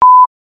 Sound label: end sound